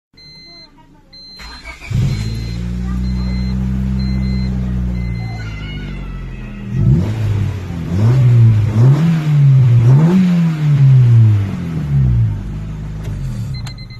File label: Honda civic start